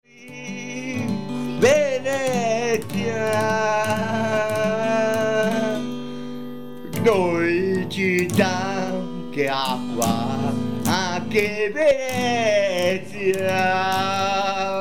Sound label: song